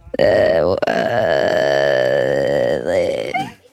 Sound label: thinking sound; Soundboard